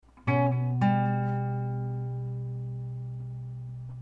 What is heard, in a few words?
suspense